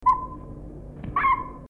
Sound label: porzana pusilla, birds calls; rallidae